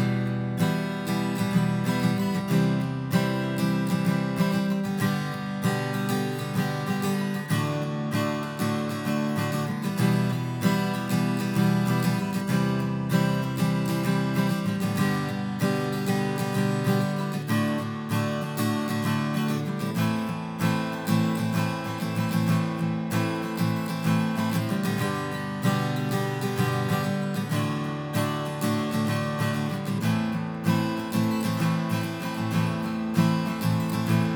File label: Strumming; Warbler 4D